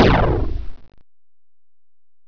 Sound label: explosion